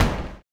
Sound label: STOMPER; Spectrasonics Vocal Planet